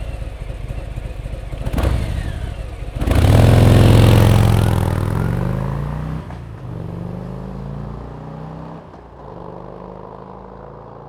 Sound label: Fat Bob 107 Take Off